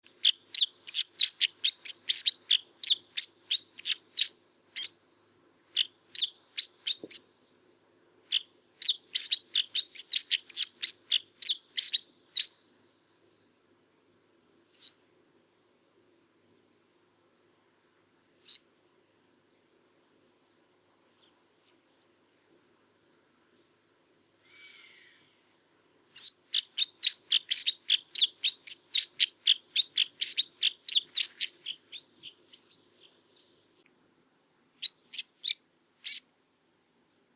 Schneesperling; Karwendelspitze; Michle Pron

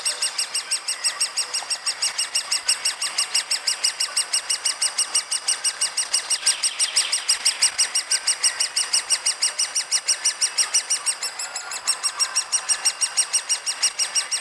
Buntspecht; Kempter Wald OA; Johannes Honold

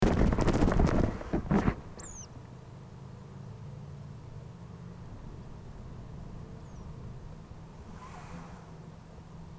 Rotkehlpieper; Tiefenbach bei Landshut LA; Susanne Rieck

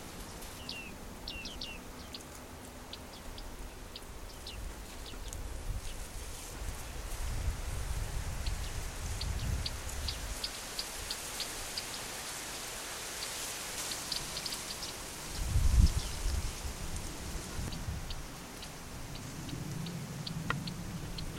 Bartmeise; Mohrweihergebiet ERH; Barbara Goldmann

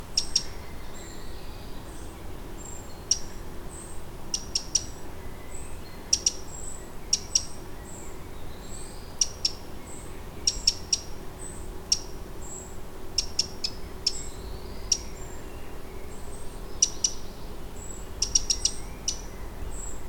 Zaunkoenig; Eibsee GAP; Michle Peron

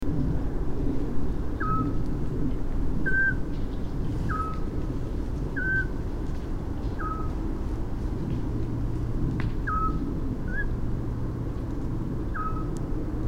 Zwergohreule; Landshut LA; Susanne Rieck

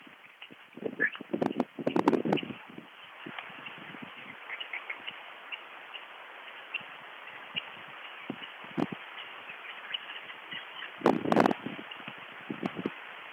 Steinhuhn; Allgaeuer Alpen OA; Christopher Koenig

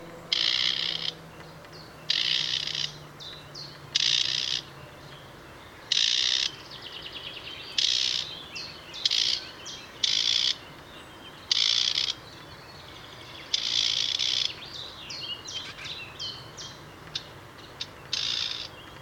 Teichrohrsaenger; Murnauer Moos; Michle Peron

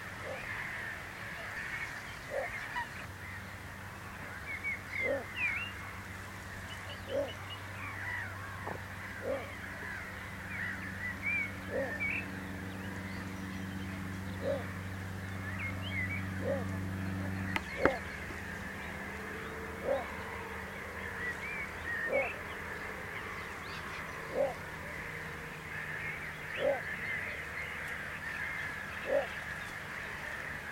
Zwergdommel; Mohrweihergebiet ERH; Barbara Goldmann

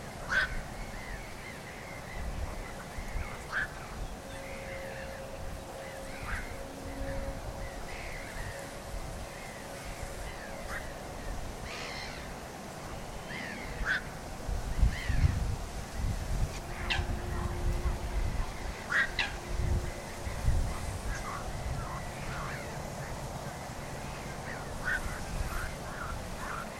Nachtreiher; Mohrweihergebiet ERH; Barbara Goldmann

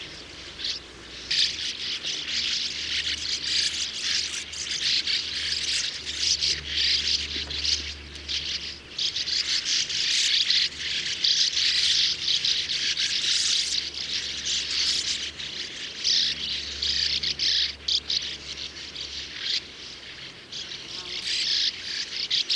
Uferschwalbe; Landshut; Michle Peron

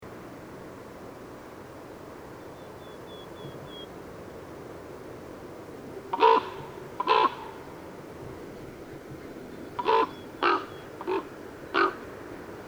Kolkrabe; Wank GAP; Michle Pron

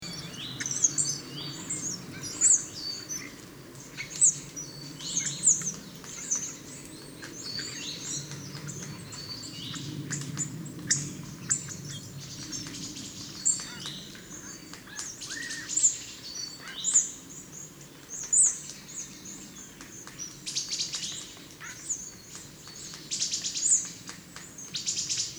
Kernbeisser; Obersuessbach LA; Michle Pron

